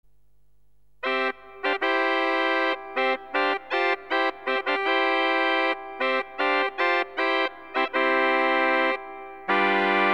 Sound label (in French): trompette